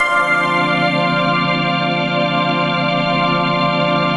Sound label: PAD Vintage Bell